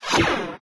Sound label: hoverpack stop; There; ThereClient